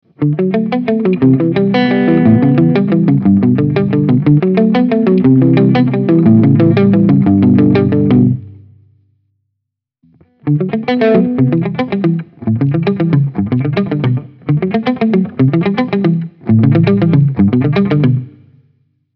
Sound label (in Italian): Pennata Alternata Sol